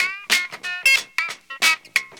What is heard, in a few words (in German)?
GITARRE; JUST FOR FX